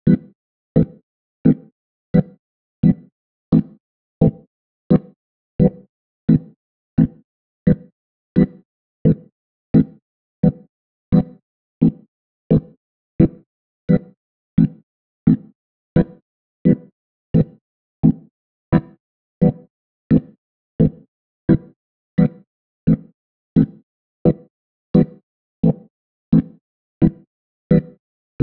Organ High Mic

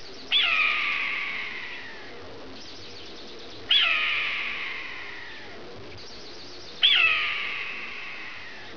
redtail Hawk, desert Sounds

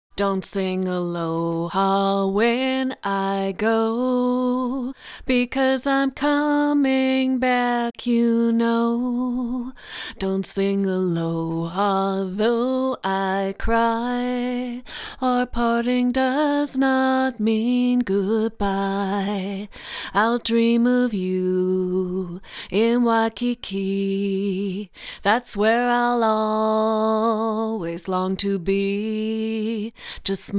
Aloha Vocal

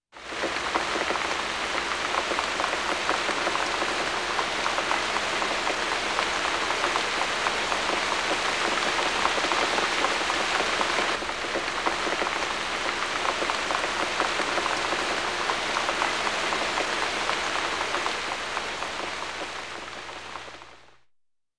rain In Woods

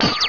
laser, spacey

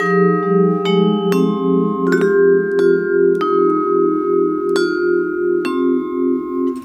Lounge Vibes, loops

